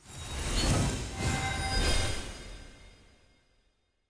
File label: celeb small; tft